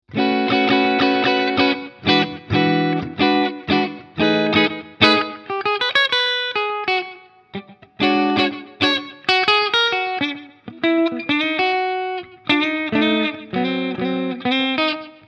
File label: Custom 24 Mitte clean; PRS SE; Stealth Quilt LTD